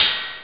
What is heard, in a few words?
hihat; battle; Amiga Soundtracker Sample Packs